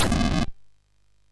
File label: Shuffle, sid MOS6581R3